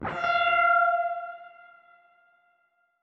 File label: hyperspace